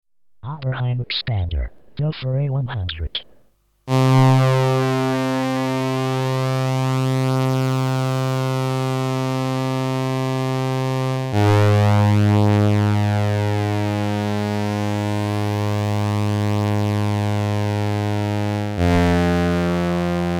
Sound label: A106 2 Pole Notch 1 Pole Low